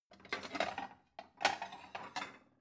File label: plate multiple take soft